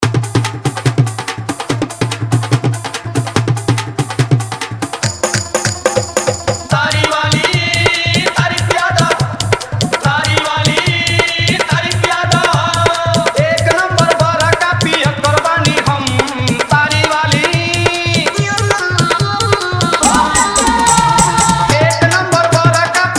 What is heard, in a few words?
Hard Dhollki Jhankar Mix